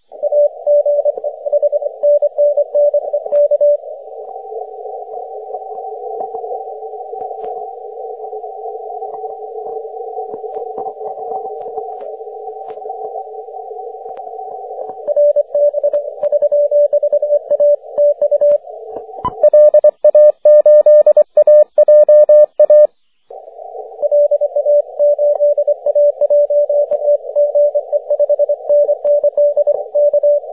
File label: 30m cw